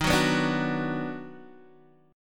Eb Minor Major 7th Sharp 5th Eb down Guitar DADGAD, chord